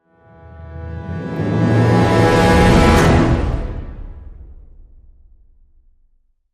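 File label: Hit Orchestra Brass E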